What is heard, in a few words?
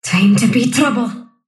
sylph move pain; dota; dark willow